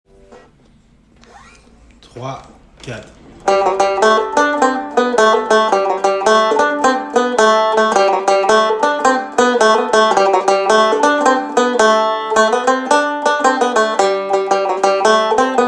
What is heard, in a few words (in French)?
Marche de Noces